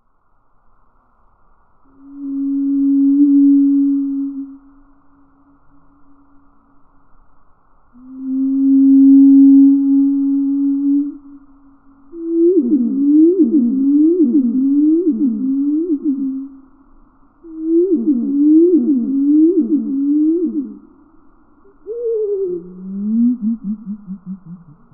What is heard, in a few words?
Reed Bunting